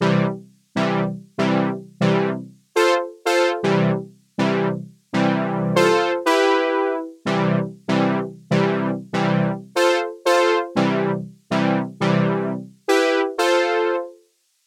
Roland Cloud Juno-106

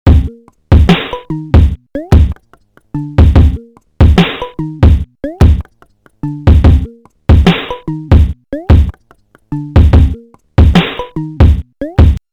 Pocket Operator PO-133; Teenage Engineering

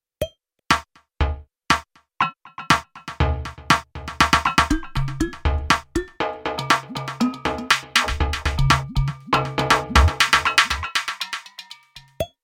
KOPRO+ Wave Drum

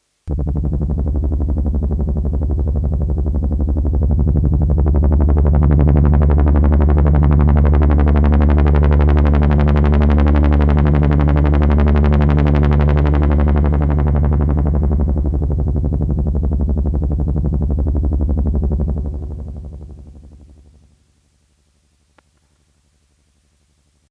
Opus 3 Heli

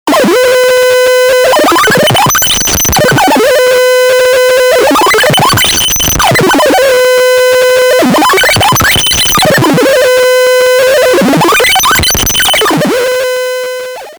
Thingamagoop LFO ARP

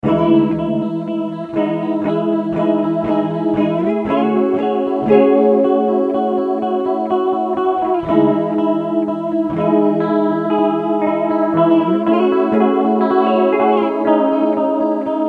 Chorus; Matthews Effect Chemist

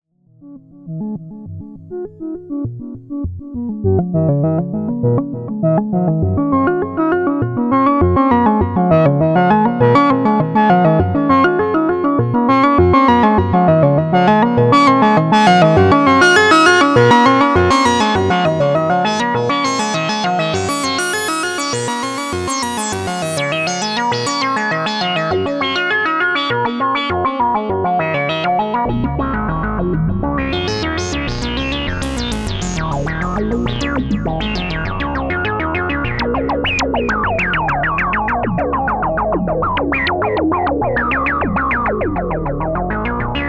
Sequence Delay 24db Filter; Romantic

